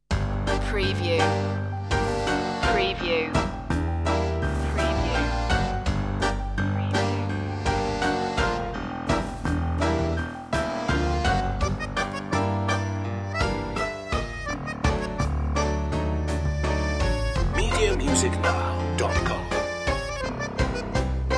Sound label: circus tango